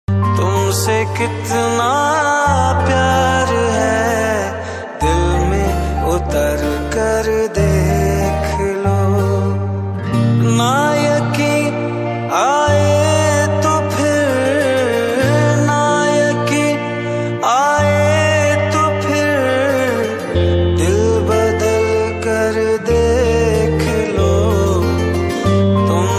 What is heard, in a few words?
sad love ringtone